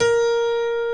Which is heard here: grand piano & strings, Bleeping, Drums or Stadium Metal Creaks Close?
grand piano & strings